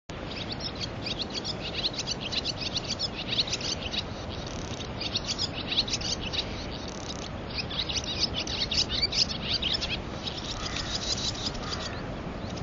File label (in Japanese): tsubame